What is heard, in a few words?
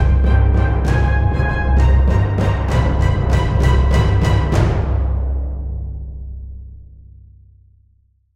Tension; Racing the Wind 01 - Post Cutscene - Tension B Long